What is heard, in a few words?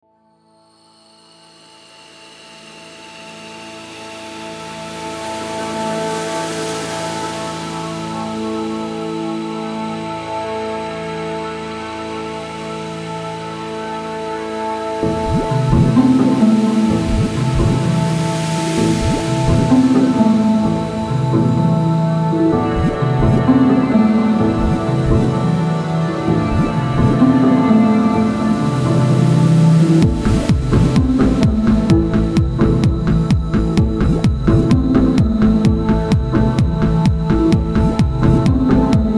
Minimal Techno; Hitman